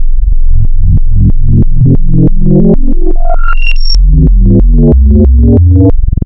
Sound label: FM Simple Sine, wavetables, Frequency Modulation; Architecture 32